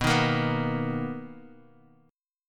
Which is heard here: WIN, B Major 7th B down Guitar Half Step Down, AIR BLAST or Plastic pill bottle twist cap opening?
B Major 7th B down Guitar Half Step Down